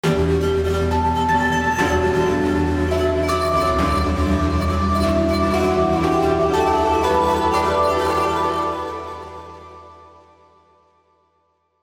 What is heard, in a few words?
Moving Ice Strings